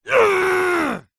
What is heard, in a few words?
human male scream pain